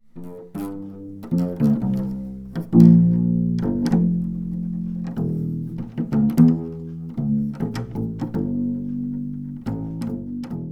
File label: Kontrabassoukulele